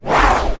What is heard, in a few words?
Speedy, cartoon; Classic SFX; musicradar; cartoon caper